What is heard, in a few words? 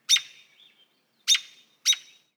bird, ambient animal; Counter Strike Model Pack; CS:GO